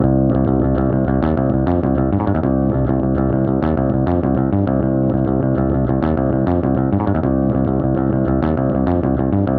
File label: Bass; mech skeleton windows gamerip 2017